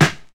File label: boomy snare one shot d sharp key; snare drum samples